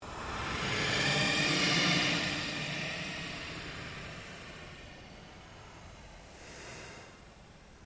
Ambient Pulse Ghost Chatter

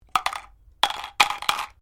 Dice Medium Drop Plastic Cup; Variety of dice sounds; Casinos

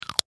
Inhaler Close Cap; Emergency; Pop Sound Effects; Pops (Sound Effects:Objects); SFX Bible 6

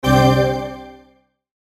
CHIME SHIMMER, Chime Shimmer Swell; Chime Shimmer Swell Production Element Imaging Element Accent Transition; Multimedia; Blastwave

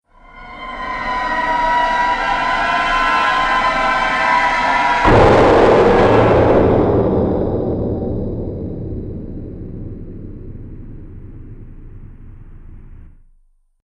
Movie Impact Night Terrors; Miscellaneous Impact Sound Effects

